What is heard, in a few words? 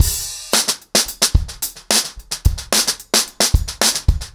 110BPM, acoustic drums, drumloops